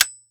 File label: dryfire rifle